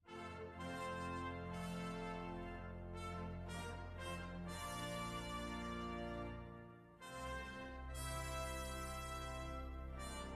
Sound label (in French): JINGLE VICTOIRE TU REGNERAS